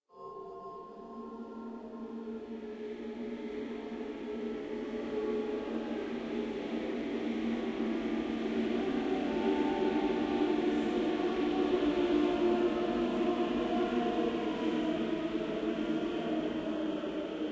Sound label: monk; DimDoors